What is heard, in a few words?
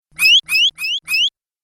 Arcade Game Bird Sounds; Video Game Sound Effects; Retro Inspired Sound Effects - Think Nintendo, Atari, C64